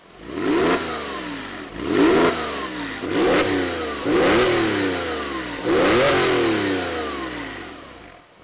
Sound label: Sport Exhaust